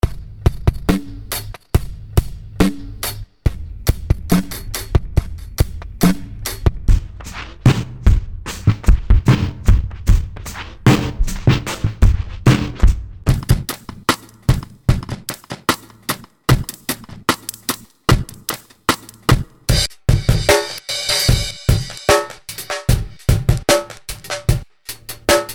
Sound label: Hip Hop Lo Fi